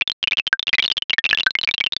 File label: 100 bubs per second (no noise); Titan